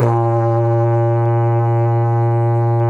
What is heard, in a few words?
BF1 HORN L, Didgeridoo and Other Primitive Instruments; Big Fish Audio; DIDGEHORN1